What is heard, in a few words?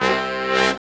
BRS SHRT, R&R Horns, Sect; Roland L-CDX-03